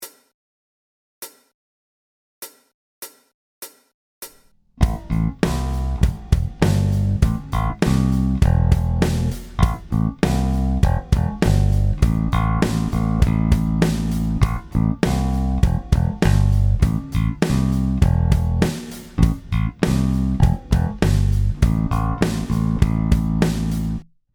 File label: Left Hand Rock, gitarren